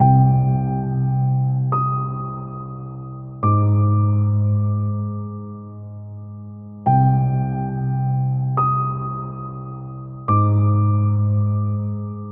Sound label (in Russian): tragicheskaya i grustnaya muzyka